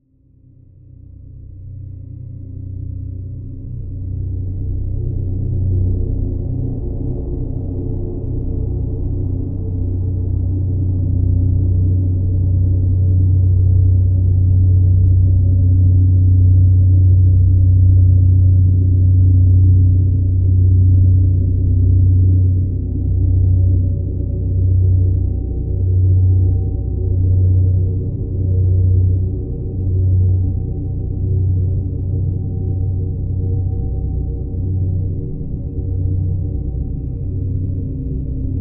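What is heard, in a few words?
Drone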